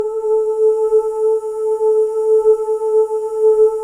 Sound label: BGV OO